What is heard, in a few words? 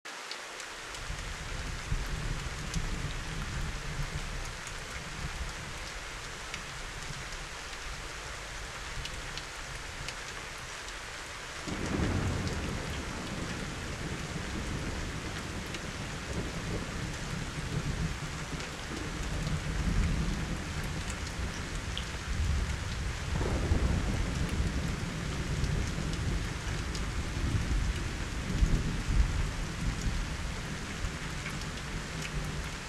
thunderstorm